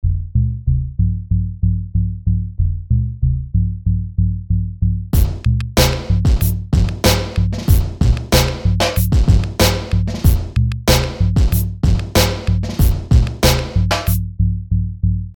seq Bass Tom

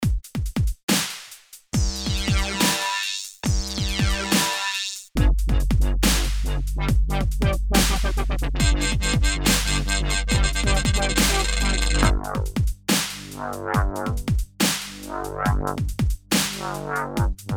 Blade Wobbles; Blade wobbles examples 1; Drums: Punch

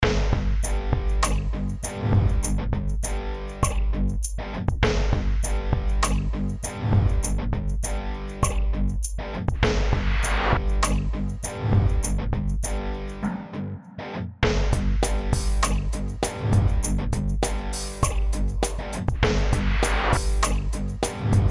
Punch Film TV

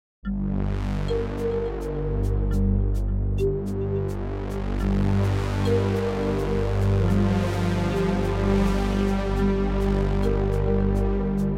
Classic Synth; Rob Papen BIT

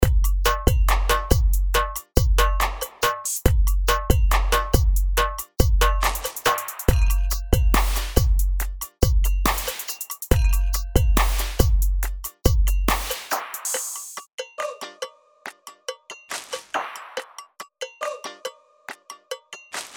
Punch Hip Hop

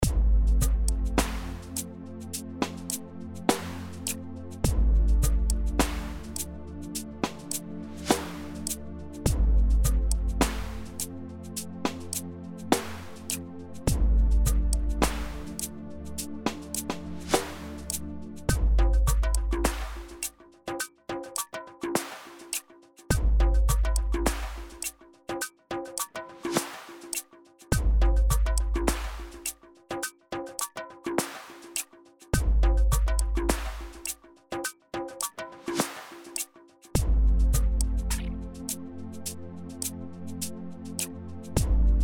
Punch Film TV; Punch Film&TV 02

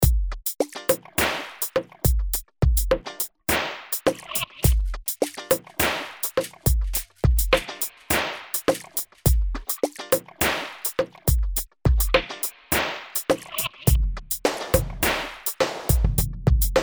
Punch Film TV; Punch Film&TV 02

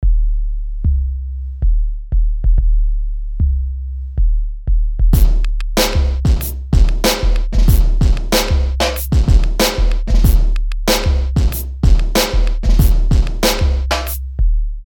Sub Kick; Atlanta

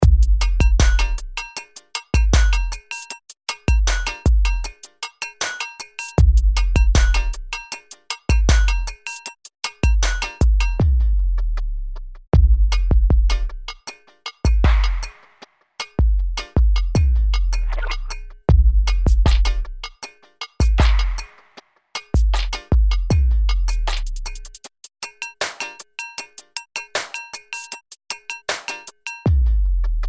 Punch Hip Hop

